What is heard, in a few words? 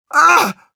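pain sharp, Russian; Engineer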